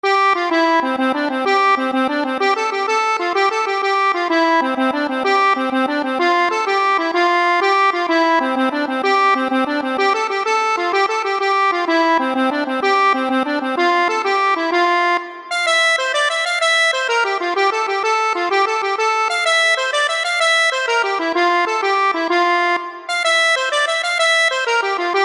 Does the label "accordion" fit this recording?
Yes